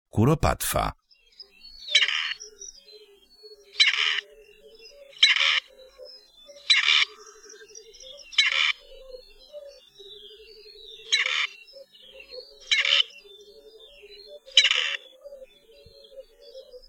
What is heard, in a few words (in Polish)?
KUROPATWA